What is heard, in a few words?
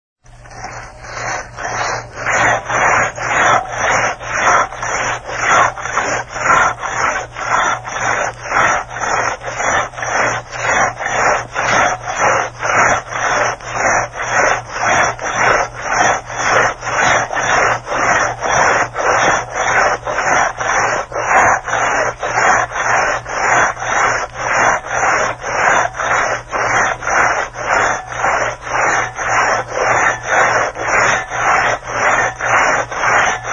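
saw "Druzhba 2"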